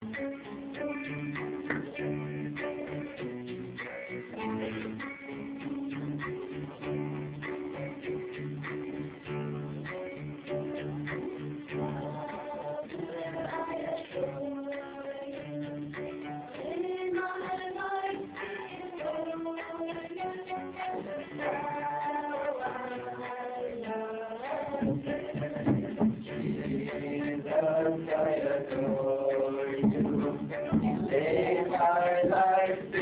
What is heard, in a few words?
chorus